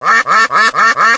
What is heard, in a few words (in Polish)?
kaczka